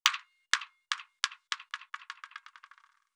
drop down, large rubber compact basketball, highpass; AnalogousNonSpeech